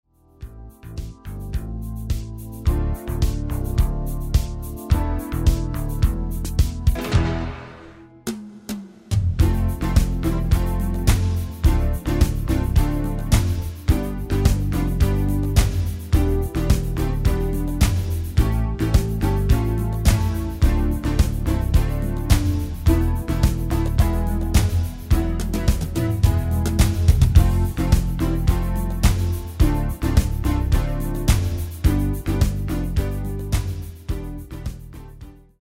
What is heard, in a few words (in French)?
instrumental